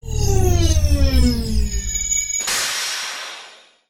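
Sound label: Hologram Ship Approach; Venture; ambience nexus tower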